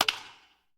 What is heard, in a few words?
Messenger Hit; GPSMAP H1